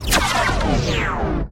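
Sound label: Production Element Sweeper; Sound Effects Bible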